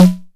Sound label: snare, Korg Electribe ER-1; Kit 22